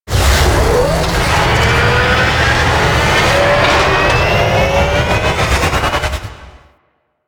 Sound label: Crp Ship Fighter Thruster Charge C; Ambience; Props; Warframe; Corpus Ship Remaster